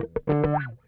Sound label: OUCH, Funk Guitar; Zero G - Funk Guitar